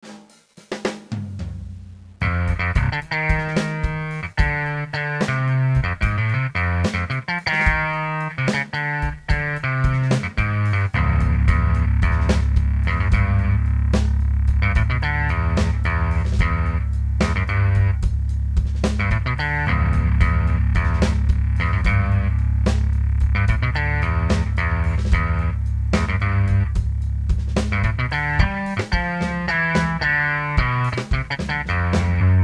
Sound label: bass